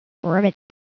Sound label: FROG